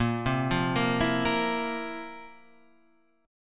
Bb Suspended 2nd Sharp 11th, chord; Bbsus2#11; arpeggiated; JGuitar

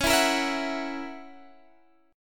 F sharp 7th, guitar

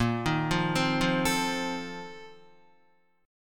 Bb Major 7th Suspended 2nd Suspended 4th, Guitar; 5,6,5,5,5,5; BbM7sus2sus4 arpeggiated; JGuitar

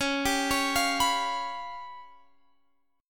C sharp 7th Flat 5th C sharp

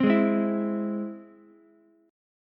Ab Augmented Ab Ukulele; Ab+ strummed; JGuitar

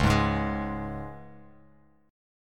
C sharp 5th, Bass Standard; strummed; C#5; JGuitar

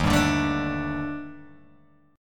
D sharp Major Sharp 11th, Guitar, Open G

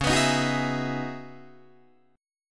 C sharp Minor 11th C sharp, Guitar DADGAD; C#m11 strummed

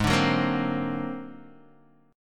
Ab 9th Flat 5th Ab down Guitar DADGAD

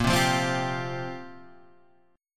Bb 6th Suspended 2nd, Bb6sus2 chord; Bb6sus2 strummed

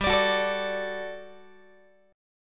G Major 7th Suspended 2nd G down Guitar; GM7sus2; strummed; x,15,17,16,13,13

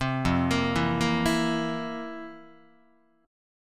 F Major 7th Suspended 4th F, Guitar; arpeggiated

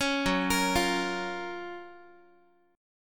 F sharp Major 7th, Guitar; F#M7 Chord; arpeggiated

